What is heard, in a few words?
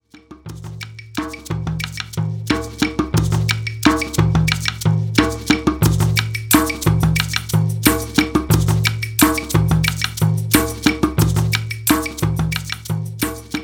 Lets groove, trommeln